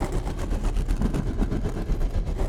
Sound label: deity triple spin; unsighted; enemies